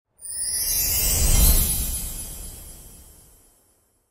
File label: starfull